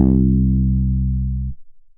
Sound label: Studio City Bass; Synth